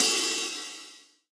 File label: Open Hihat; Cymatics; Eternity Sample Pack; Drum One Shots; Cymbals